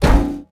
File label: impact metal medium